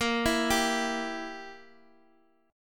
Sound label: G Minor 6th Bb